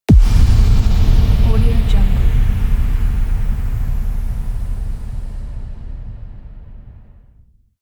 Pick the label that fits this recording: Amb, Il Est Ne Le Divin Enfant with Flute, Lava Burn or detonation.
detonation